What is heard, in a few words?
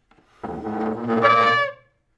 Wooden Chair